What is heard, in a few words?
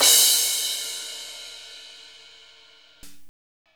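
cymbal crash; K-Crash st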